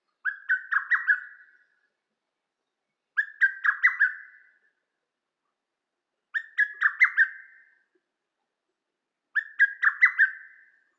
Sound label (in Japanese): hototogisu